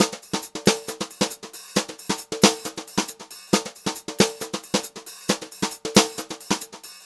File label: Brushed Drum Loops; USB Soundscan vol.39; AKAI; HOTROD